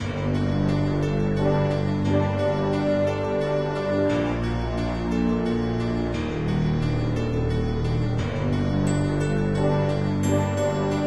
dungeon; MapleShrine

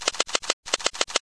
typing; MapleShrine; mkxp; gameasync

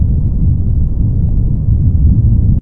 Quake; MapleShrine; mkxp; gameasync

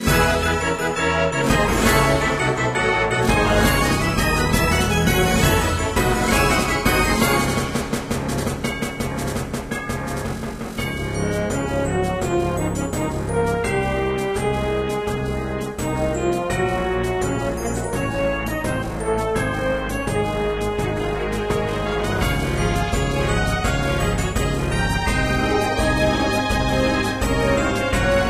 ship; MapleShrine

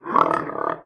Animal; MapleShrine; mkxp; gameasync

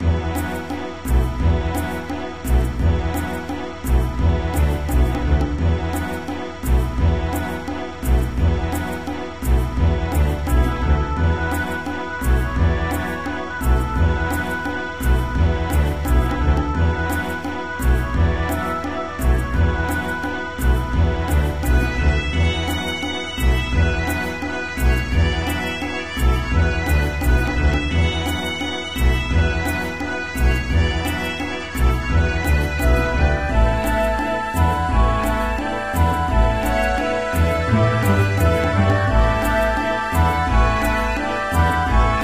Town; MapleShrine; mkxp; gameasync

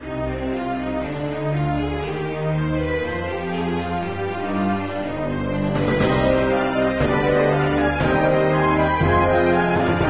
Last Boss; MapleShrine

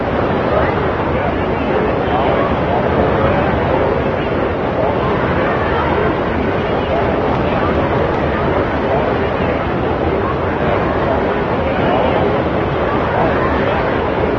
People; MapleShrine; mkxp; gameasync

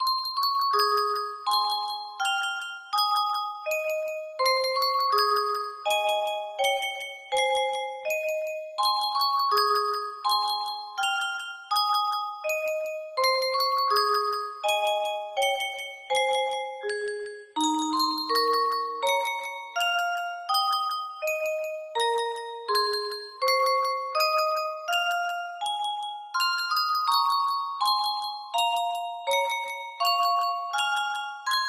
slow; MapleShrine

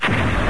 dash; MapleShrine; mkxp; gameasync

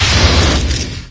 Thunder; MapleShrine; mkxp; gameasync

